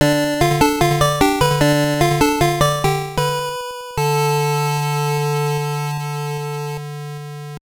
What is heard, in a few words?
Unknown Jingle; Rastan Saga; Game Gear